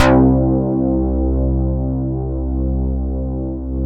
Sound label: MKS BASS; AKAI S6000 CD-ROM - Ultimate